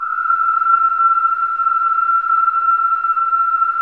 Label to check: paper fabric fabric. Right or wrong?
Wrong